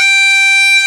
CHANTER G4, Bagpipes; E-MU Formula 4000 Series Vol. 4; Earth Tones

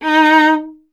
viola D; Miroslav Vitous; Solo Instruments VOL-1

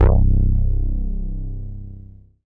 Dance Floor Bass, Multisample Basses

